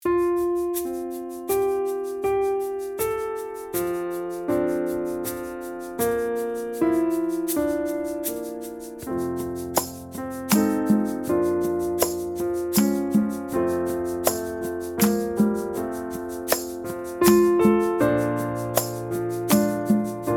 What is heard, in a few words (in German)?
leise playback langsam; ohne jegliche Chorstimmen